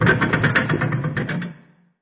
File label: door open